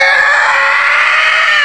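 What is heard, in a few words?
zombie infection, zombie plague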